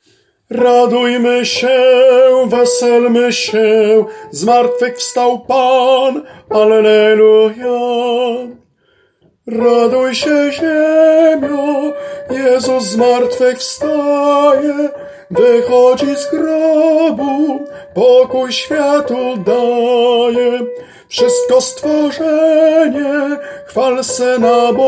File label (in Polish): TENOR; Radujmy sie